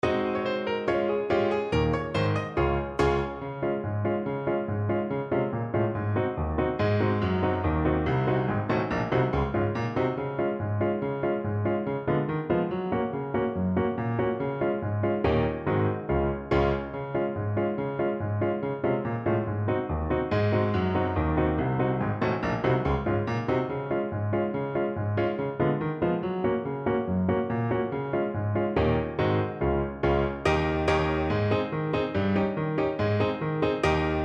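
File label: alto sax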